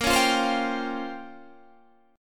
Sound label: Bb Minor 6th Add 9th Bb down Guitar Standard; strummed; JGuitar